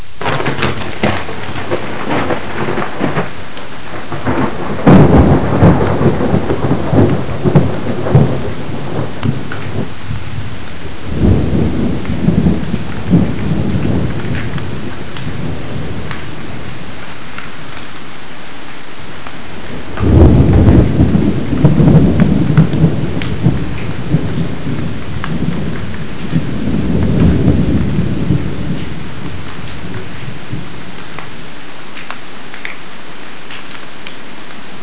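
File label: thunder rain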